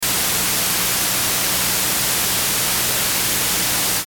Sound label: Static Pure Static